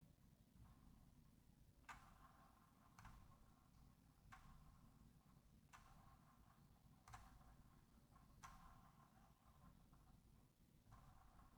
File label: logicool g502 x plus wheel sound